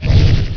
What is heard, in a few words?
BOOM; THEREALM